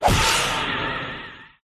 bug block

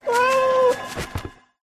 Cat Die; Pet

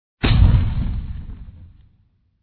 fire attack contact middle; krofdor

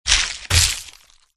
cat attack contact middle

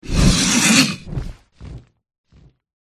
dragonkin attack voice bottom

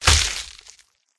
riding bug attack contact bottom

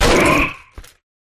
Rat wound

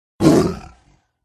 tiger wound

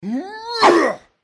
bot human male attack voice middle

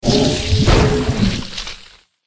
turtle death

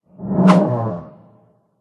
attack voice up

